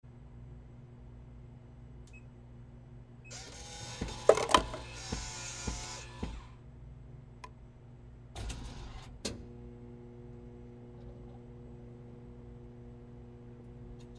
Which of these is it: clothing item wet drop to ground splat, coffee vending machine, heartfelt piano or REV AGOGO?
coffee vending machine